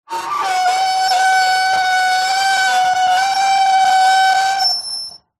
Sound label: metal scrape on metal med harsh shrill